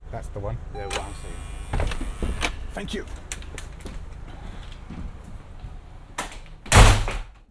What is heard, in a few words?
UK Buzz